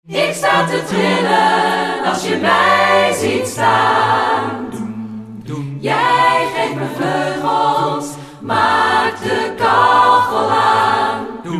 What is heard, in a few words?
ssaatb